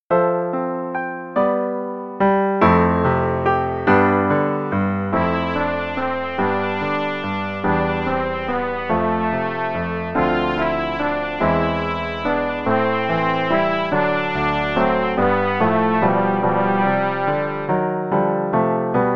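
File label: Play-a-long, in C, both parts, quarter note = 144, trumpet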